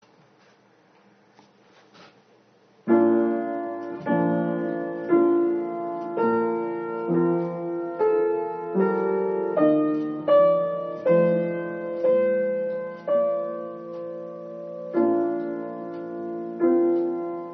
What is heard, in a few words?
Psalm 119 piano